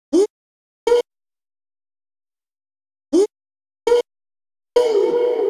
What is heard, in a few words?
80bpm Shouts FX Loops